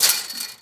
Fence Chain Rattle; Foley - Rattles

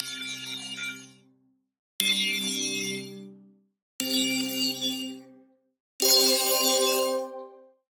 Digital Click Positive

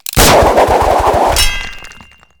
Gun, Grappling, Shoot With Hook Impact; gun and weapon sounds; captain audio; fully loaded

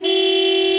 Car Horn Loop; Automobiles

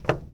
Plane P40 Step On Wing; SFX Kit; Airplanes

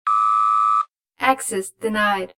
access denied; RadwinIsland

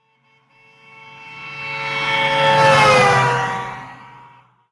car horn; Blind Drive

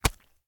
GORE Stab; mono; GORE & SPLATS; Universal Sound FX

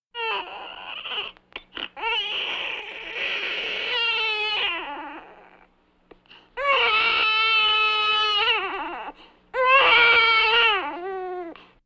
Vocal effects breathing Moaning Baby

